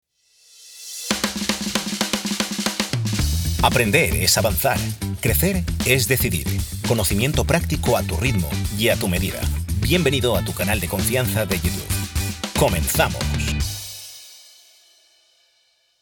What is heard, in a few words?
jingle